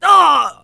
death; jihadist

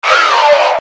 fear die

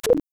radio blank; usmc

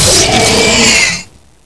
alien attack

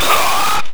Pain

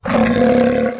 velociraptor

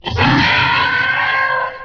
raptor alert

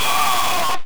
Die; usmc

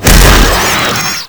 warrior hiss

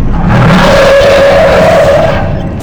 bloodsucker scream

